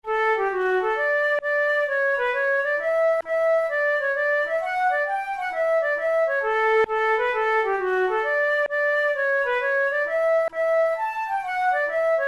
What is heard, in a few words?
hornpipe, flute tunes